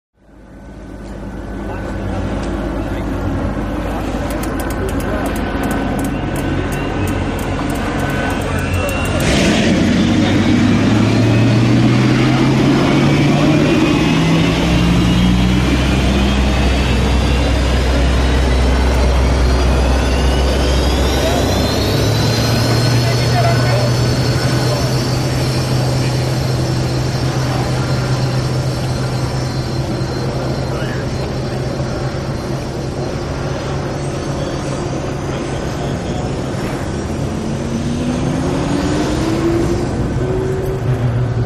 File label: Hydroplane Away Amb